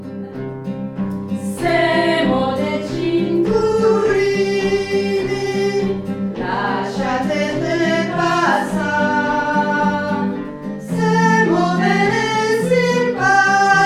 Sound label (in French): SOPRANES; Cinturini